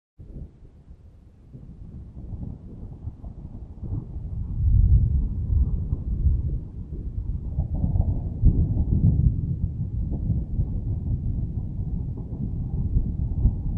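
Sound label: Thunder Long Gentle, distant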